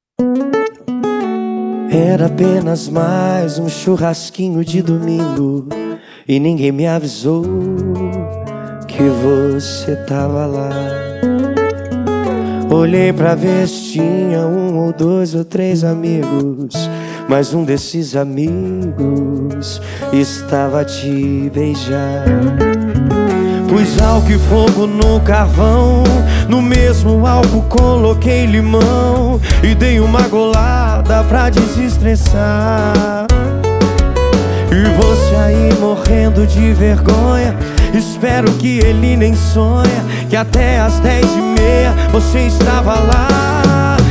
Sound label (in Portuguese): Sertanejo